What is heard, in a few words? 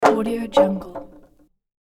plank drop hard onto concrete; hollywoodedge